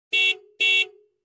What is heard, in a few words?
Car Horn twice